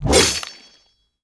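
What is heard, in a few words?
Dash attack; Gamigo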